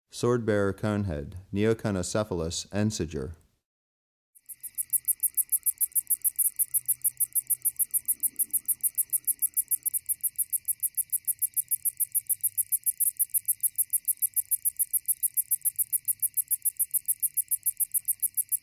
Swordbearer Conehead Katydid, Neoconocephalus ensiger; Crickets and Katydids